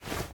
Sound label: Cloth Crouch Up